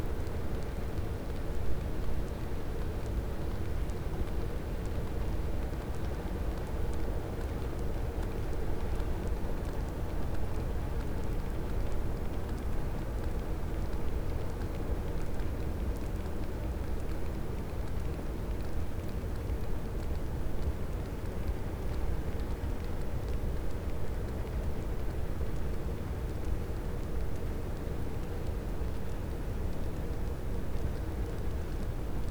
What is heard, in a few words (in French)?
cachalot positif, Physeter Macrocephalus